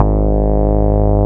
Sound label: BAS MINI, MOOG; Sound & Vision - Gigapack II CD 1